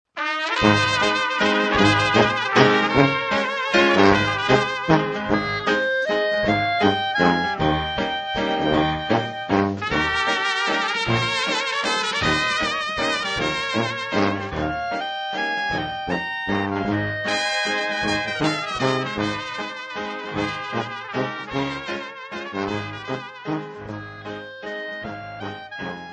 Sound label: waltz